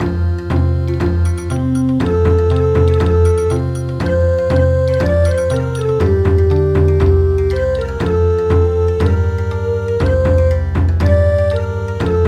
Bon Odori

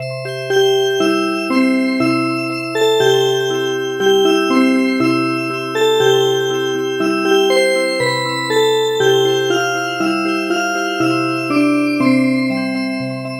Music Box; Umupo Po Kayo

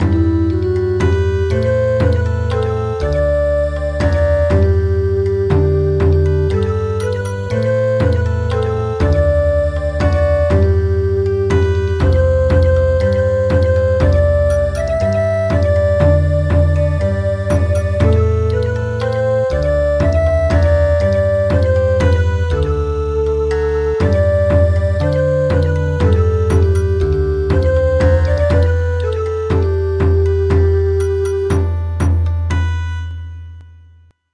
Bon Odori; Muran of England

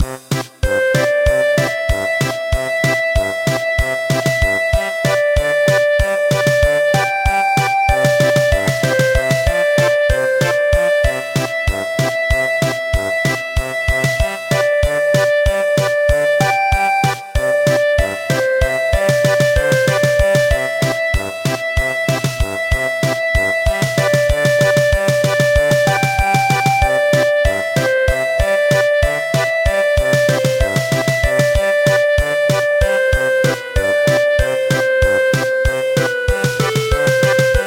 German Polka

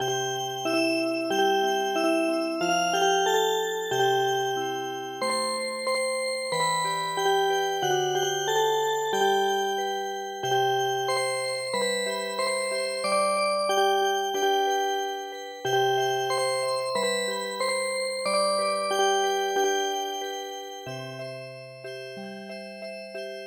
Music Box, lullaby; Winkum Winkum Shut your eye

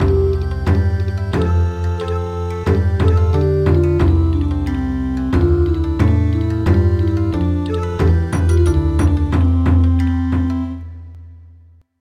Bon Odori; Goodnight Song for Dobbin

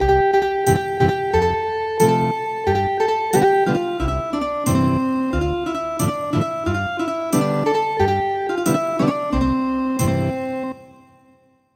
Ethnic Guitar; Goodnight Song for Dobbin

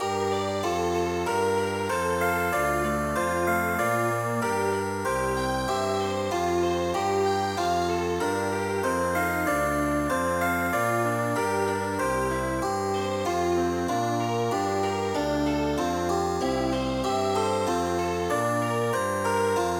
Promenade chamber

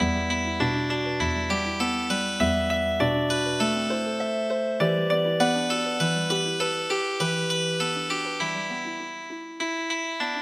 Healing Harp; traditional

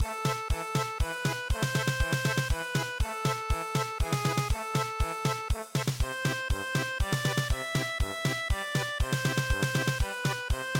German Polka; JARDIN DE MONASTERE

